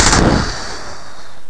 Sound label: destroy; Jarkanoid